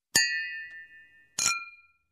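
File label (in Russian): звуки чоканья на празднике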